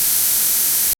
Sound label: an air fryer opens with, appliances